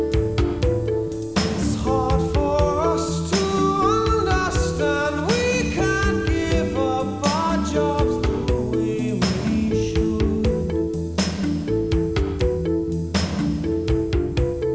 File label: rock